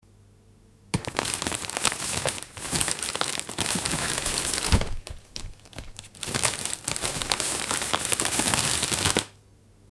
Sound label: folding clothes and putti, clothing